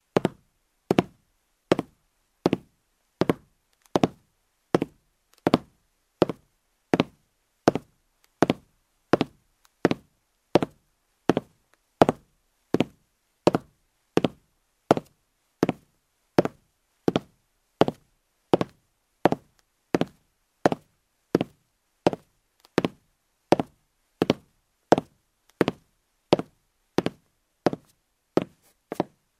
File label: Steps on the wood floor in shoes